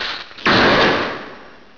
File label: zap; svencoop; panther